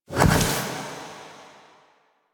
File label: Go Board Opponent Place; Warframe; Quests; Sacrifice